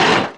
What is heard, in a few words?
SCRAPE